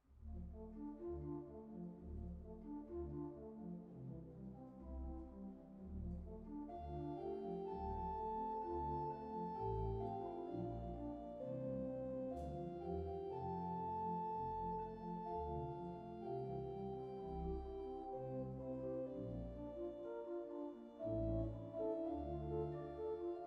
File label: mictest organ, microphone comparison cardioid; rode